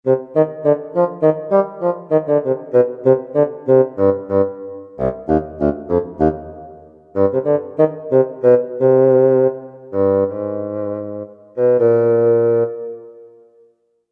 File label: Solo Basoon